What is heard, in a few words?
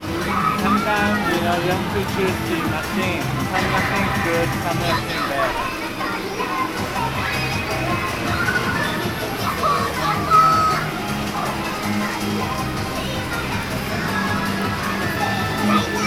pachinko, tokyo